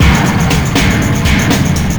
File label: industrial action